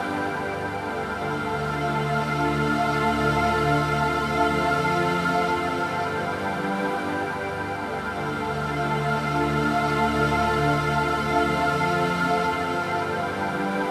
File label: Pad 138BPM WET, D minor; Melody & Synth; Vengeance Samples; Nightfly Kit